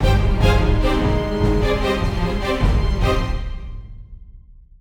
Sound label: battle, Orchestral Background Music